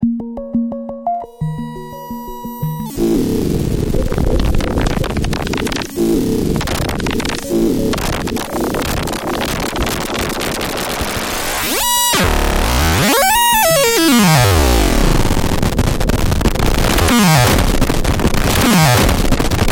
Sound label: Sphere Delay